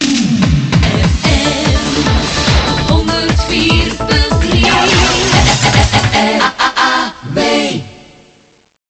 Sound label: jingle; RAWd